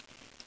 silence

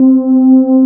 warmpad; cubic

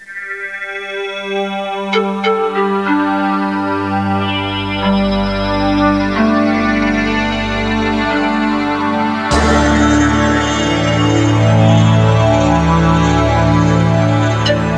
orch

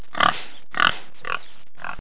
pig

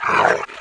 attack; allods2d

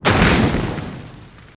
builds; lotr bfme2

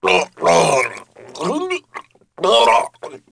gargle; SiN; blade

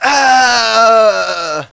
death; SINDEMO; civmale

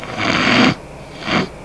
snort; People; Sound Library 2000; Wayzata Technology

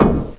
BUTTON

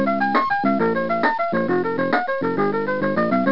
slow rock

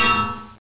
Refrig Metal Klang

